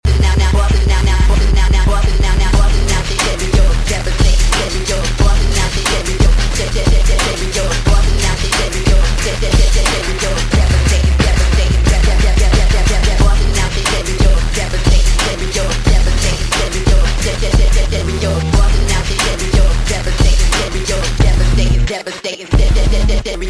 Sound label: stereo remix